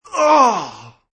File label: Disgust, Man grunts in disgust; Voice; Man grunts in disgust, Variation 2